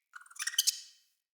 Messenger Rat Chatter G; Ambient; Vocals; Verminia; Animals

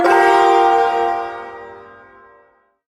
Ersatz Shawzin Phrygian Chord J; Music; Chords; Warframe; Duviri

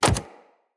Companion Step Default, Footsteps; Pets; Zanuka

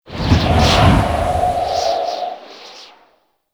Balloon Move Start; Warframe; The New War; Vehicles; Unum Tower Balloon

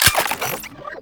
Modular Secondary Handle Slow Reload, Guns; Warframe; SU Modular Guns; Reloads

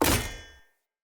Short Sword Throw Medium Impact Wood Hollow Large; Weapon Impacts; Player; Swords; Environment Throw

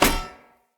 Sphere Polearm Medium Impact Default; Player; Environment

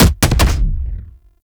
Scaldra Pistol Alt Fire Punch

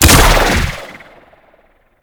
Exalted Bow Fire A; Tenno; Ranger

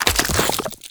Step Sludge, Footsteps, Walk; Warframe; Tenno

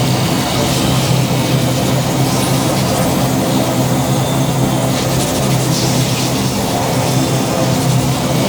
Portal Shatter Open Loop; Warframe; Duviri; Ambience

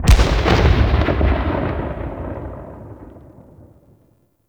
Weapon Enemy Grenade Tail Outdoor; Warframe; Weapons

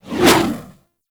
Spear Throw Fire; Warframe; Corpus; Spear Gun

